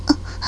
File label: pain, player